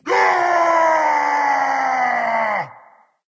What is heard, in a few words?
battlecry; smite; voicepack